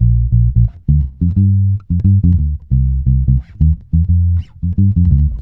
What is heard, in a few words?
JOY DUB A#, Bass; Spectrasonics; Bass Legends CD 1